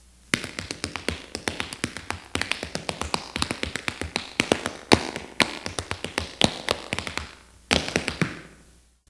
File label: solo TAP